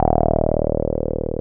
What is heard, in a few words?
303 HLLW EB1, ROLAND TB303; Big Fish Audio; First Call Vintage Keyboards